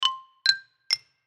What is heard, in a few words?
Xylophone Melody, Xylophone Melody Short; Mallets; Xylophone Melody Short Muted; Blastwave